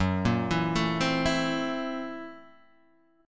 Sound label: F sharp 7th Sharp 9th Flat 5th F sharp, Guitar, DADGAD